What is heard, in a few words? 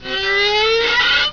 SQUEAK